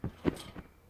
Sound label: trampoline realistic